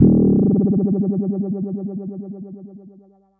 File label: Sci fi